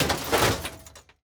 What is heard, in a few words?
metal break; teardown; ps5 windows xbox series xs